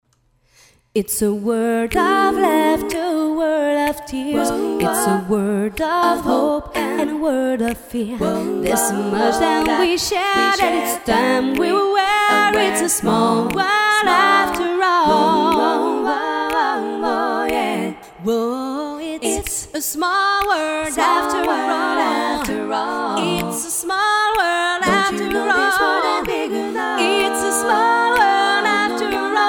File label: accapella all